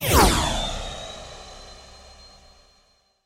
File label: tower attack; tower potan